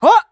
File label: flyer attack